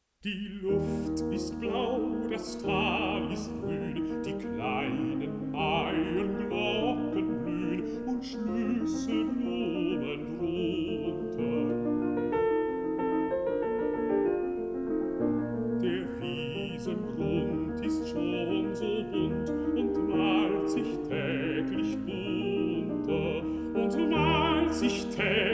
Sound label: song for voice & piano